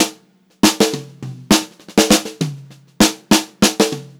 FILL, Funk-Groove Drumloops; USB Soundscan vol.38; AKAI

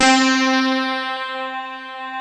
keys; DCS KEYS

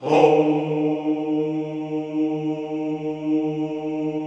CHO ES2; Sound & Vision; Gigapack I CD 2; CHO Soumm+Houuu

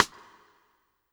SIDESTICKS

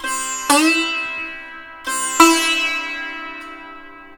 SITAR, Indian Traditions

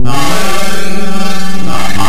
RING NOISE; Big Beat Samples & More; AKAI

